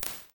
Jazz Guitar, Impulse Responses, Small Spaces, Rooms; Apple